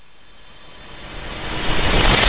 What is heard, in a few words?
powerup